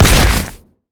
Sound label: carpet bomb; rumble skin03